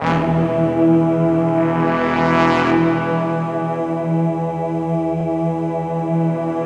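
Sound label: BRASS, Slow Brass Choir; Optical Media International - Sonic Images Library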